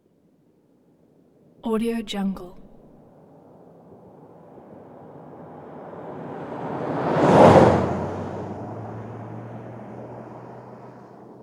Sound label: compact car slow pass by